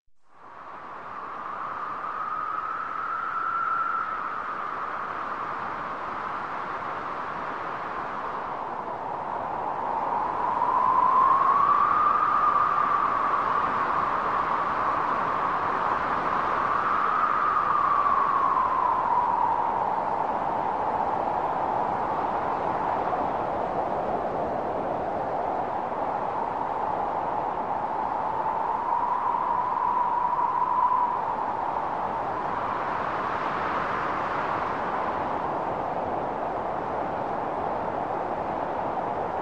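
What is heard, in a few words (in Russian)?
Звук пронизывающего зимнего ветра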